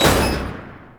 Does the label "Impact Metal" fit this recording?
Yes